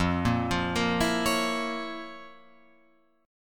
F Major 7th Suspended 4th Sharp 5th F, Guitar Open F; FM7sus4#5 arpeggiated; JGuitar